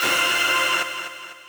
stab

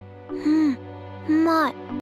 hmm bass; 音MAD; 少女终末旅行; 소녀 종말 여행 음원팩; Syaro Sample

